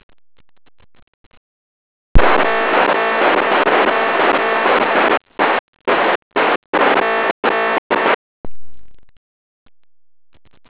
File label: signal